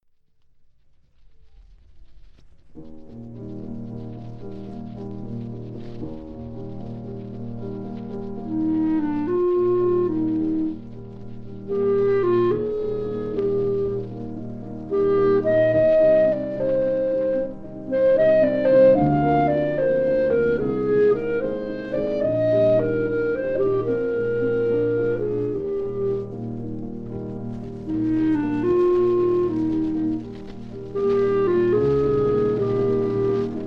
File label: Concert Band; St.Saens